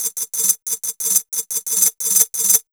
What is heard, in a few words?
DRMS, Pure Electro; USB Soundscan vol.03; AKAI